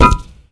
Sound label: concrete block impact hard; Half Life 2